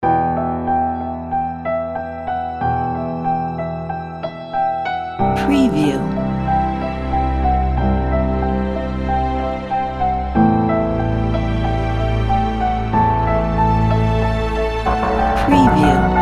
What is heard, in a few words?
dramatic trailer